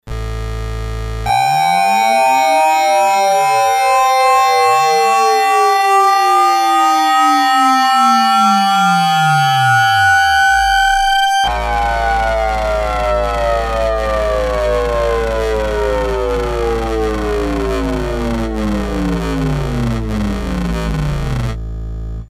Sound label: midibox sid